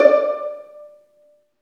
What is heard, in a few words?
String Vlns Pizz; Roland - String Master Series; Vls Pizz